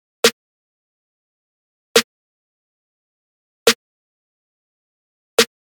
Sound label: Snare Wet